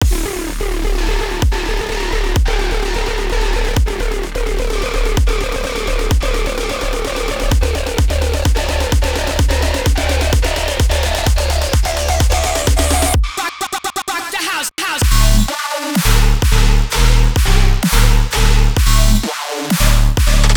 Heavy Electronic; Heavy Electronic Music Pack Vol. 2

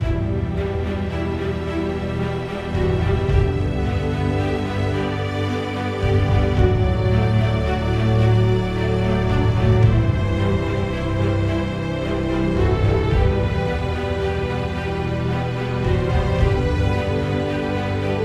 Orchestral Ambient; Orchestral Ambient Music Pack

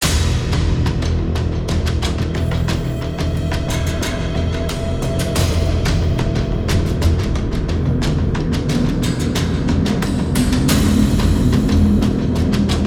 Horror; Horror Vol 4 Music Pack

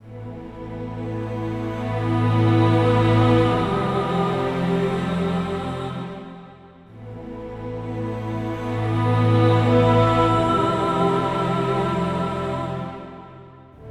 Orch Ambient Mystic Voice; Orchestral Ambient Music Pack Vol. 2

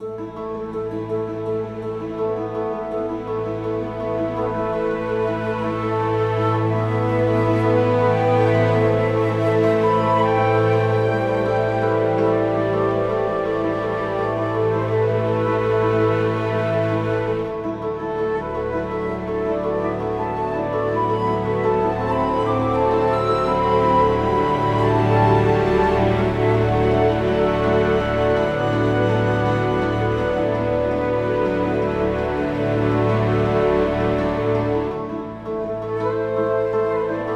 Orch Ambient Wind Spirits; Orchestral Ambient Music Pack Vol. 2

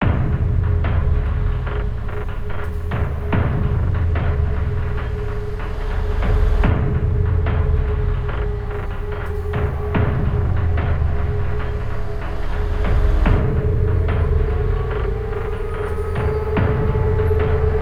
Horror Trudge; Horror Vol 4 Music Pack

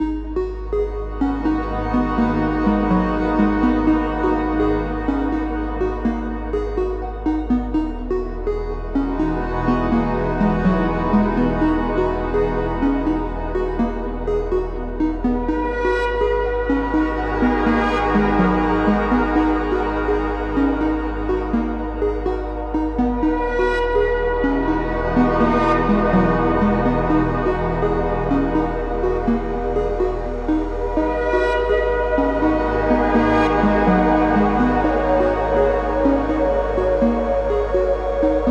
Electronic Ambient; Electronic Ambient Music Pack

